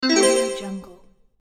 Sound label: retro 90s video game confirmation menu sound